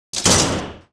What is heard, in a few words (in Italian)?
porta sbatte